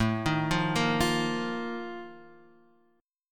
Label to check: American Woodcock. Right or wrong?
Wrong